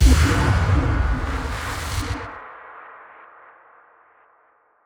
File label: Impact; impact samples